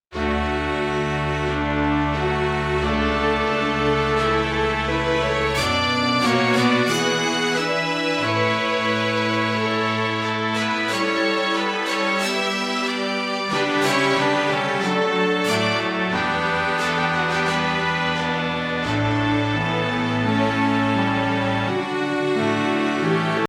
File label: anthem; CHESS